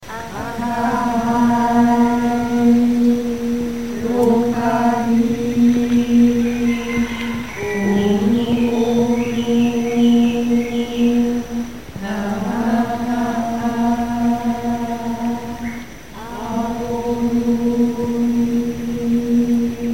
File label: Aloha Chant